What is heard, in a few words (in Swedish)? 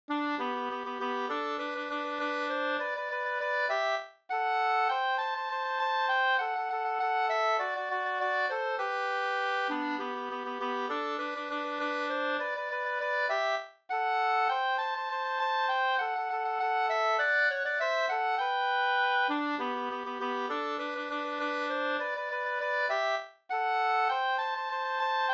polka; Babba-Lisas hyfsn Doroteapolketten 2st